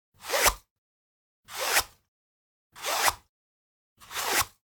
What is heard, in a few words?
Generic Slide; boom library; The Interface